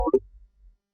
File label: closecall